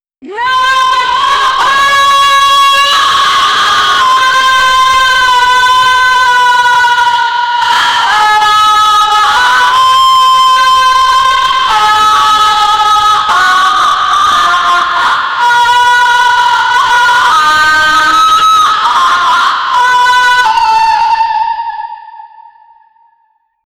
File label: evil laughter and a woman; drama